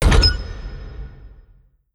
clunk, pallet winch

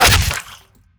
flesh impact bullet; physics

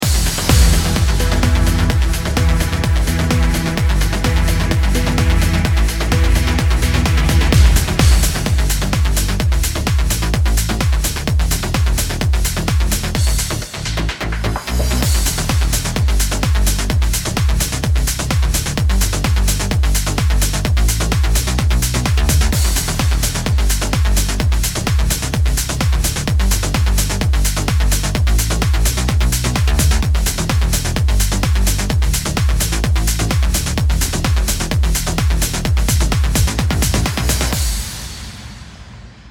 bomb planted

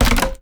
board strain; breakables

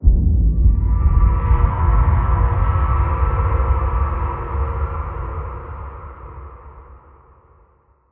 amb startup boomlight, ambient

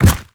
step soft, zombie blind; Half Life Alyx; creatures

